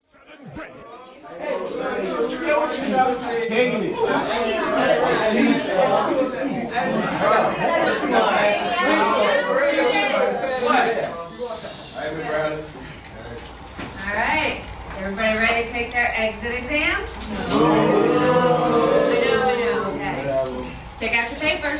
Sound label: parody